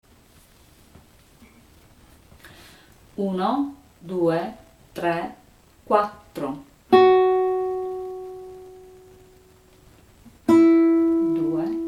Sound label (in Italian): Chitarra, Vento